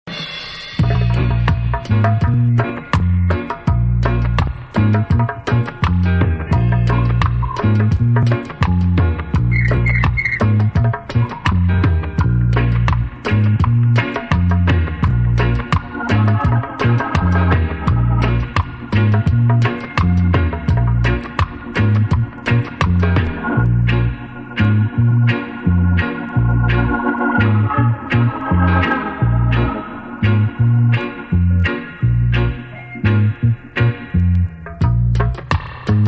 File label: Drum Song